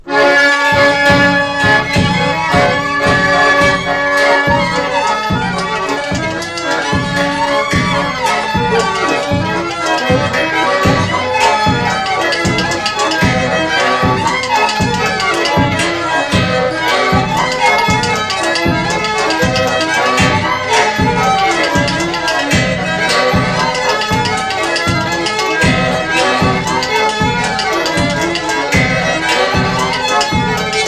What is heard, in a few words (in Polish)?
Oberek